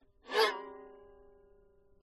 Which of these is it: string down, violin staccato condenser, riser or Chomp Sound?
violin staccato condenser